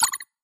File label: reels start; Magic Stars 5; Wazdan